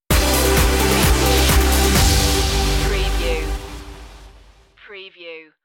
forward motion stinger

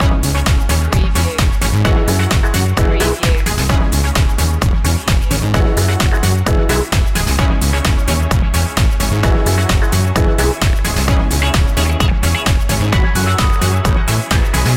pump steady groove loop

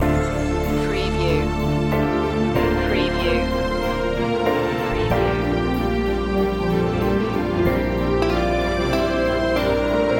fantasy waltz loop

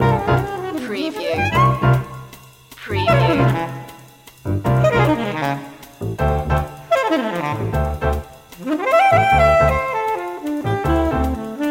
crazy sax loop

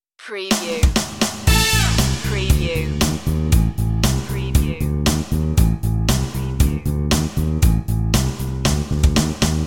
spy lounge loop

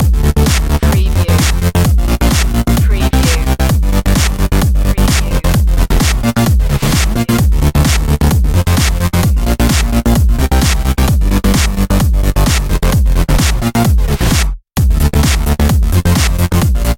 block party loop

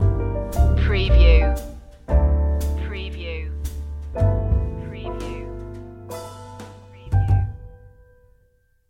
muddy water stinger

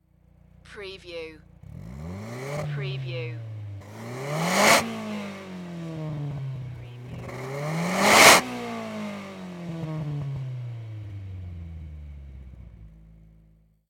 NISSAN SILVIA EXHAUST TRIPLE REVS

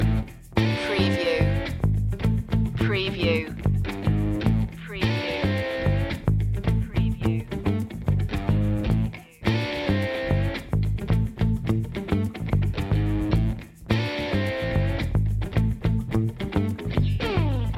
casino funk loop

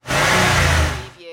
Truck Ford F150 Rev Engine Perspective

